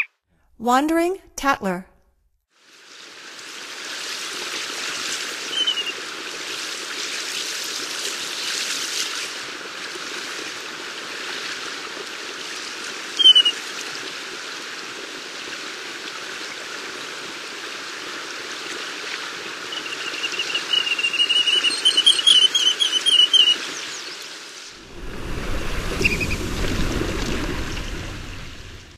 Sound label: Wandering Tattler; North America; Stokes